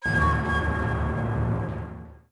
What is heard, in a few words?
Sting Defeat; Mini Game Tienka